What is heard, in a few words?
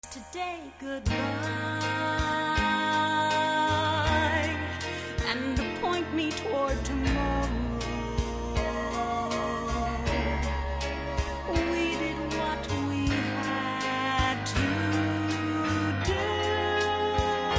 chorus